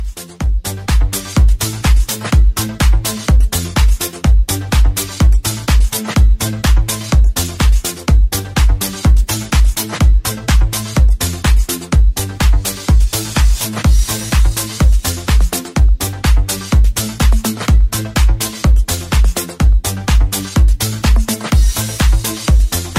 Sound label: Deep Snap Remix